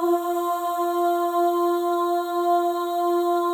OH, FEM, Symphony of Voices; Spectrasonics

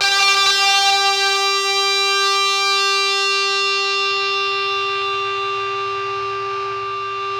ZWEEP; Spectrasonic Distorted Reality 1

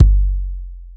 BD, Drums Dance & Groove; USB Soundscan vol.11; AKAI

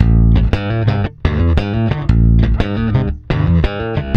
PWR B, Bass, PWRSTRUM; Spectrasonics; Bass Legends; 128 PWRSTRUM

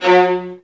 VIOLINS G#; ILIO; Synclavier Strings

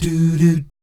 DOO DOOT, Vocal Planet Jazz

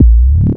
Dunk, Drums, Industrial; Sonic Foundry (Sony Creative Software)

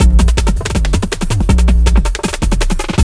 JUNGL 160, JUNGLE LOOP

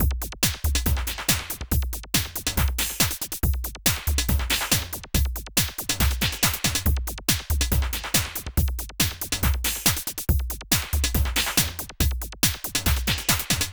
140, loops; PROCESSOR2

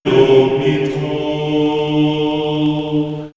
Monks Gregorian; vox mix; X-Static Goldmine

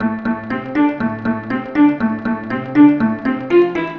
Ensemble Arpeggio; Sonic Foundry (Sony Creative Software); Club Joints and Street Anthems; Ruff Side

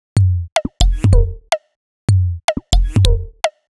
125bpm, House Drumloops

